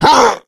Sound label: hulk pain; Left 4 Dead; Source Engine; tank